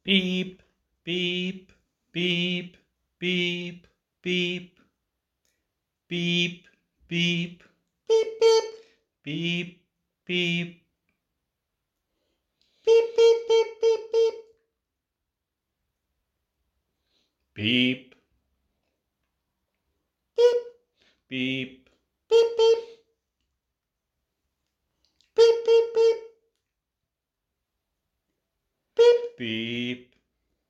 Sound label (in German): Rätsel Morsecode